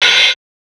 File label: Hats, Alesis Performance-Pad